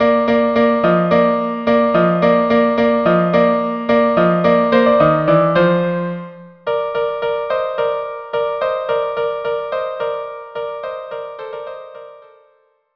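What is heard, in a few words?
viola duo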